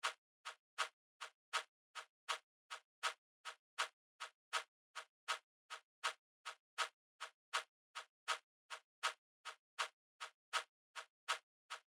Drum Loop - 80 BPM Shaker, lofi; Loop Stems; Autumn

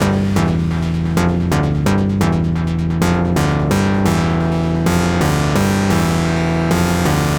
Synth Loop 130 G, Techno; Loops; Synths; GhostHack; Ghosthack - Free Techno Sounds 2024

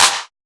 Clap, Techno; Techno Sounds 2024

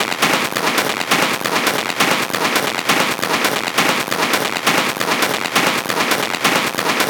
Perc Loop 135, Techno; Loops; Drums; Ghosthack; AC2024 - Day 1 - Techno Sounds 2025; Percussion

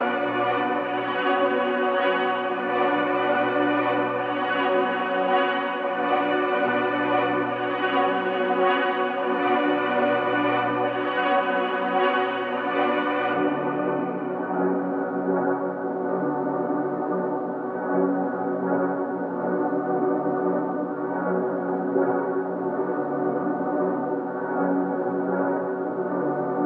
144 BPM B Min Resample, Drill; Melodies; Loop Stems; Cymatics; GHOST (Beta Pack); Break Out

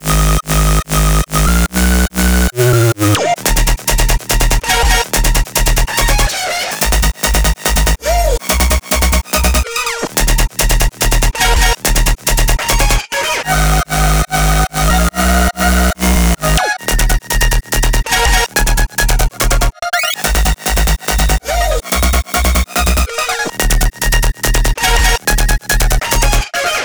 143 BPM D# Min, Melody Loops; Hyperbass Pt 2; Cymatics

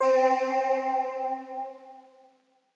SYNTH (C); One Shots; Orchid; God's Voice

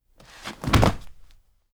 BF Combo Light; Fairlight Sound Lib

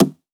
Percussion, Hip Hop, Drum One Shots

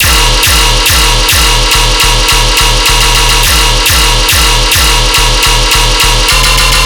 Bass Loop D# 140BPM, Dubstep; Grim Reaper's Anthem

